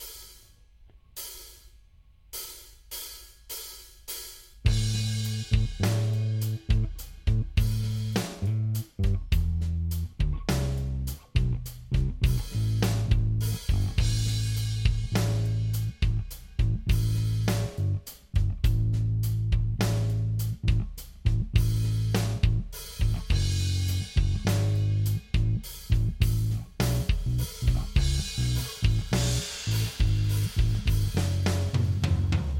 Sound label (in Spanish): pista acompañamiento acordes increíbles con notas al aire